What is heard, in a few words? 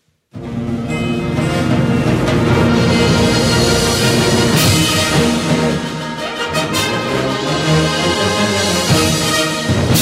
trumpet town